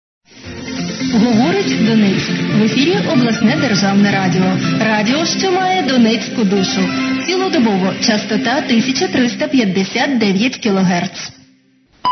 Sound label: interval signal; ukr; ur donetsk